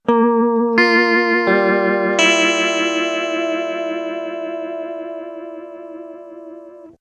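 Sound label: clean guitar pigtronix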